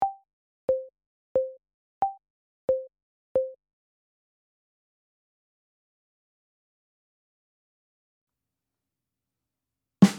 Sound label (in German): Duett Snare ohne Click